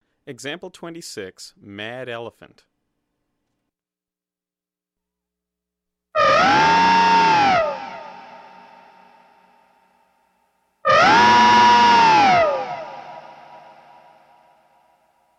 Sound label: Whammy Roar